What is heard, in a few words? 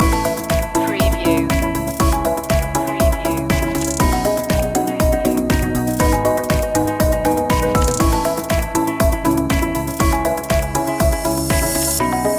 musical notes loop end; media music now